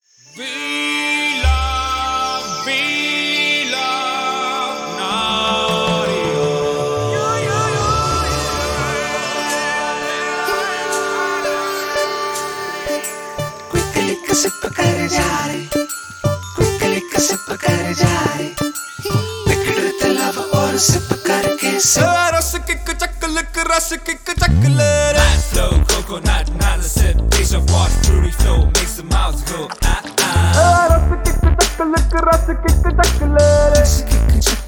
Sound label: jingle